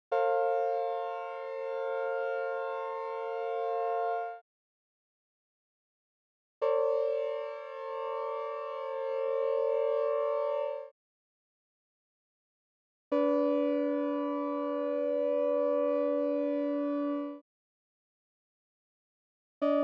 unison chords

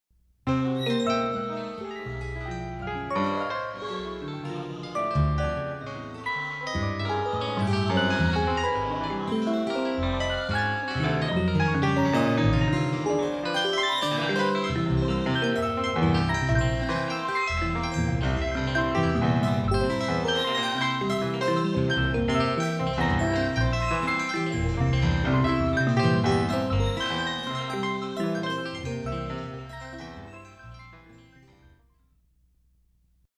harmonic morph

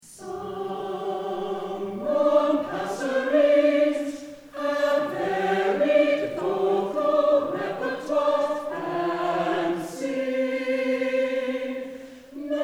Grunt, rounds

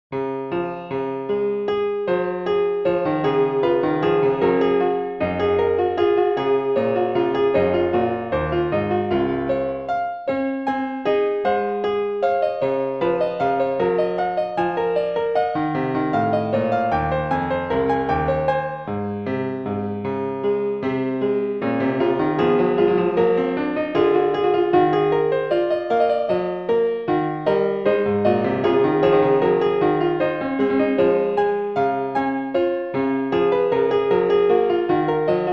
piano; Csharp Fugue bk2

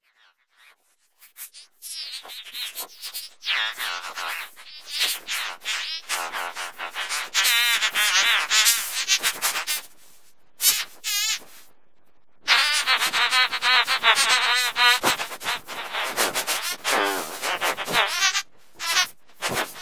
Chris Choir

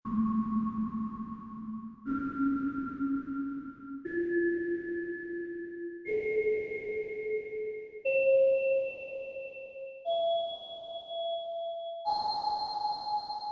canon; dodge

